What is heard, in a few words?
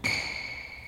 shoe; RS Games Sounds; Monopoly